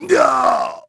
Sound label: DEATH; NIXON; Pulse Racer